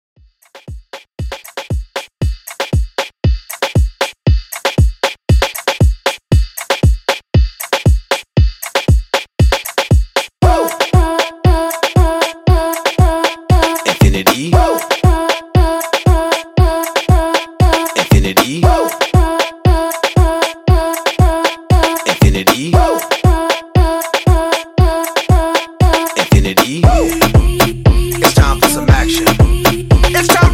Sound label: Dance Hall 117 Bpm; Mixshow